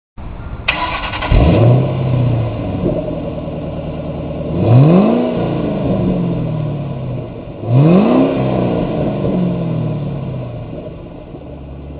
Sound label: Exhaust, mustang